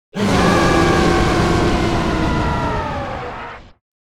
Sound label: Large beast monster ferocious roar; fantasy